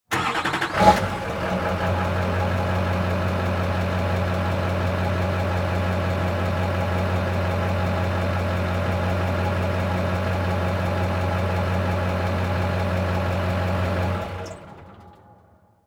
Transportation Truck Diesel Truck Start Idle Off Mic In Rear